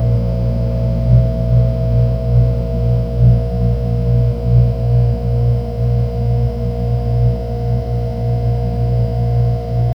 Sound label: sfx, appliances